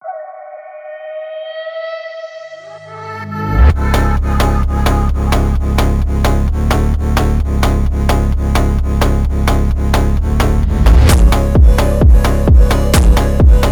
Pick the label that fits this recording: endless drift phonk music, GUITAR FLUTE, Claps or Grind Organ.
endless drift phonk music